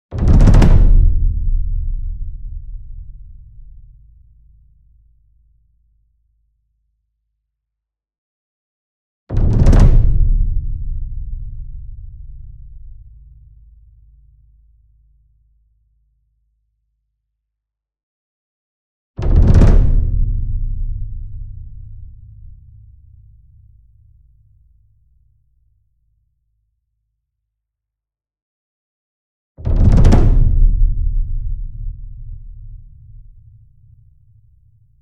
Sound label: ENSEMBLE DRUM Bass Drum Concert Roll Near; boom library; Cinematic Strikes Construction Kit